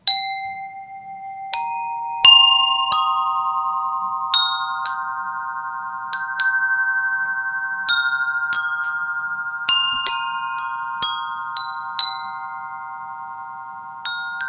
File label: Carillon notes